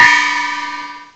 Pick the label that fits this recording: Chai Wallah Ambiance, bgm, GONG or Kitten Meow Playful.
GONG